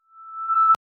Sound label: horror puzzle completion